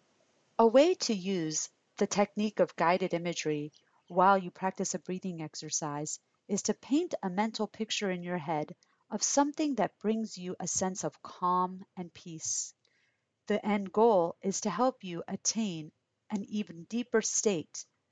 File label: Breathing